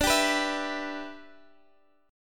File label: E Minor 7th D